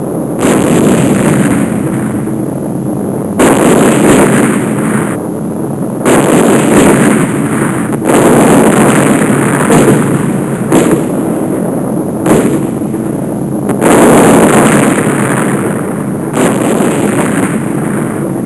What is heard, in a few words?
flak; fx; warbirds